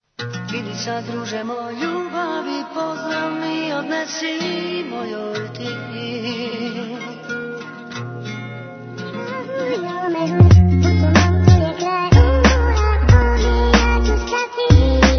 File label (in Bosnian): klarinet, ringtone; izgubljeni